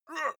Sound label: male shout hit soft